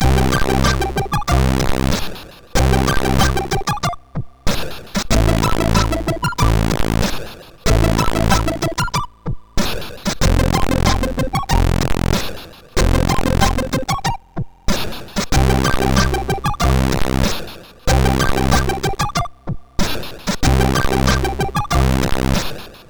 Star Music, MOS6581R2

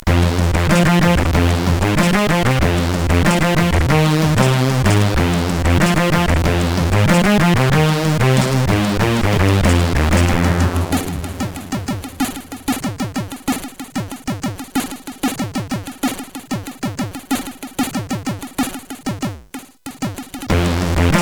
Jingle Bass sid CSG8580R5

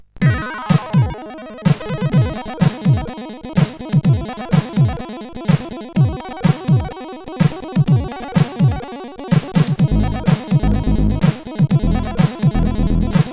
sid, CSG8580; Visual Dance

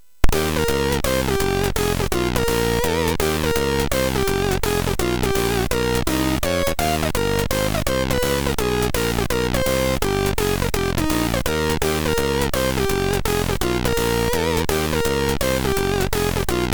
Jazz, MOS6581; hvsc

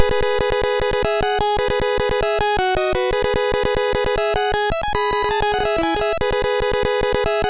Dragons Den, MOS6581R3

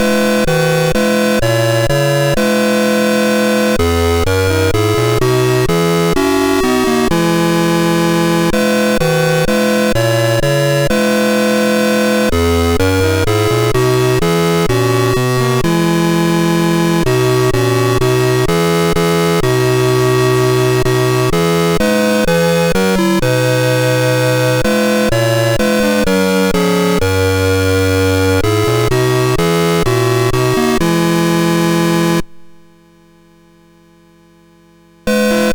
Gezang 135, MOS6581

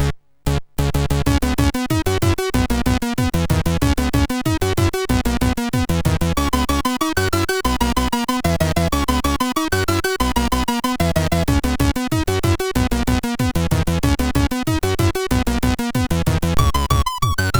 Dance, sid MOS6581R3; Creo

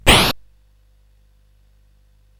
Paddle Mania, CSG8580R5

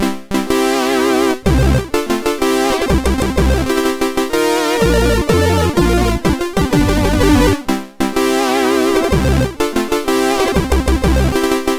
Fanfare, sid MOS6581R4

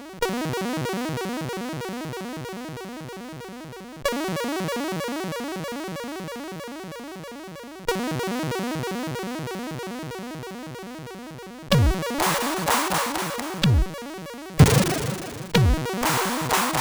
sid, CSG8580; hvsc

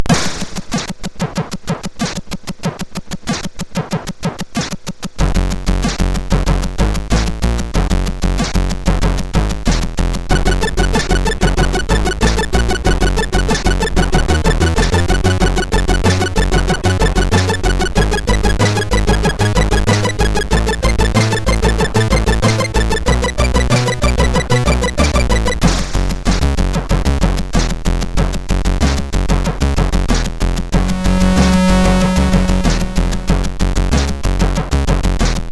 sid MOS6581R2; Maniac Mansion

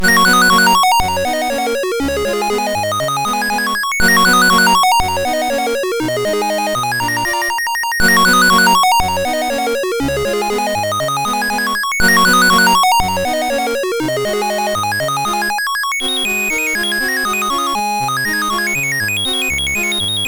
Etude in G Flat Op10 No5, MOS6581R2; Dave Marquis

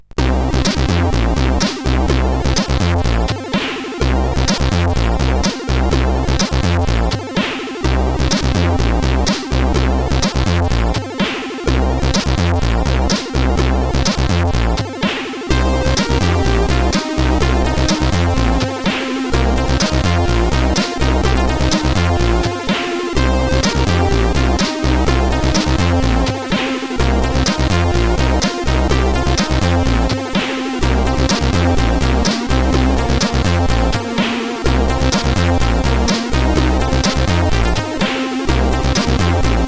sid, CSG8580R5; Shabazzed

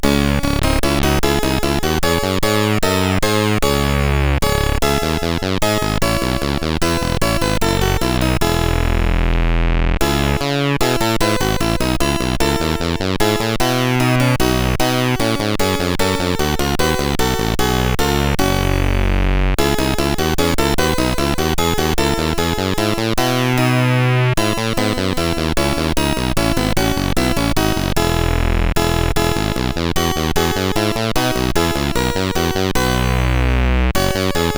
Bach CSG8580R5; sid

